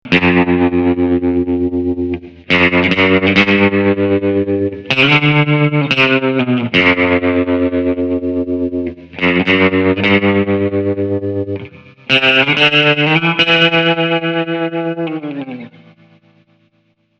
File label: Dan Electro Western